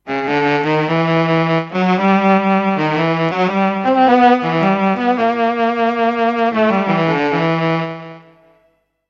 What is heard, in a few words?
Saxophone